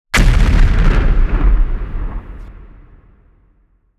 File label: global Explosion; UltimateShooter